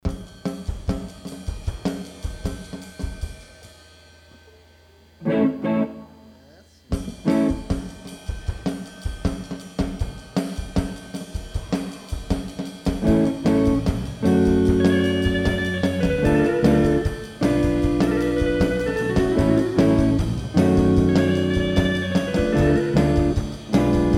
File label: clave bossa nova